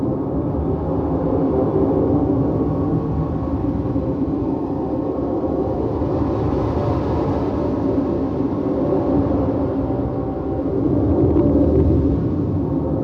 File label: Cockpit Back Room Amb; Railjack